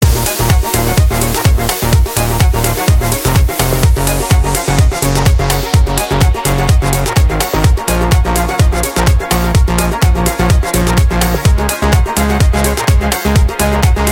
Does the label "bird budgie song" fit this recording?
No